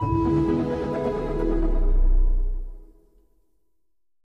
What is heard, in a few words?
quick Spingle; fairyhansel